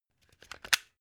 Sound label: plug unplug clatter